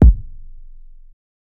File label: Kick